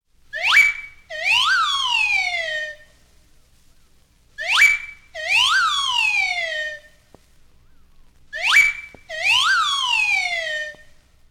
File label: Slide Whistle Three